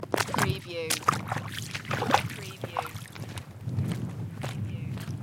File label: Hiking puddles and wind; beanstalk audio